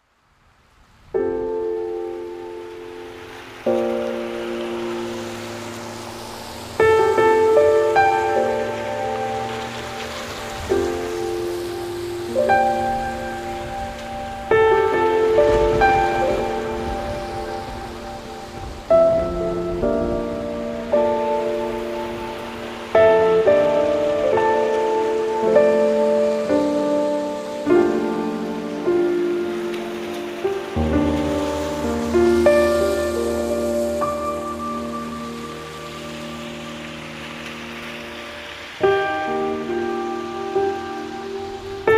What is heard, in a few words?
Piano and Rain